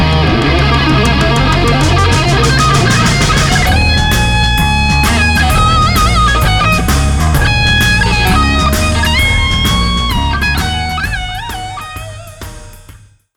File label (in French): Blues